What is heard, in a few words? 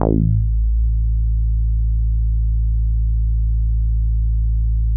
Synths BASS